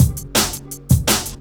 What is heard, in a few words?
Break, Jungle; Overcast; Breaks